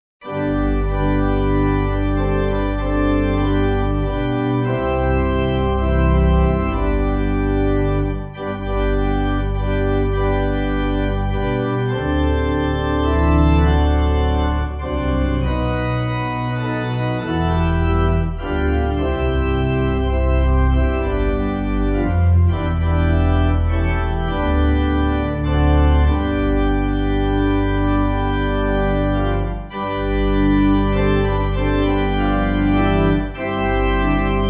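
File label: pipe organ, Music Backing Files for Churches; G.T. Haywood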